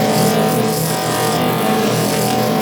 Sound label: Gas City Power Core Laser Loop; Warframe; Corpus Gas City; Gameplay Remaster